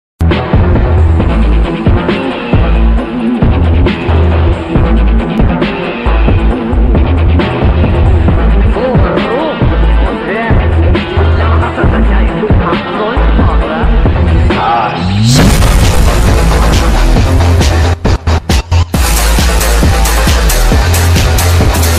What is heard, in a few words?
Psy-Trance Ringtone